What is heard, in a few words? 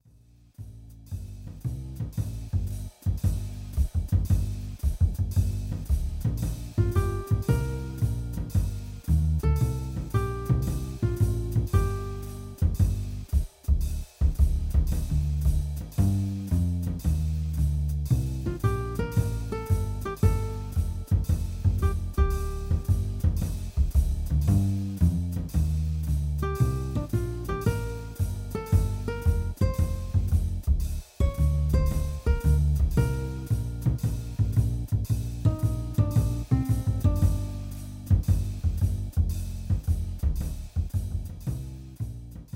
Score Sample; Tarantella